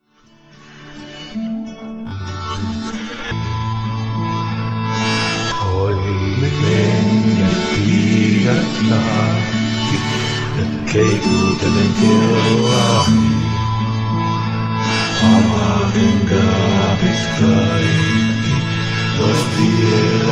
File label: ACOUSTIC; Achreniuksen juomalaulu